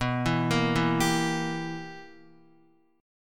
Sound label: B Major 7th Suspended 4th Sharp 5th Guitar, chord; BM7sus4#5; arpeggiated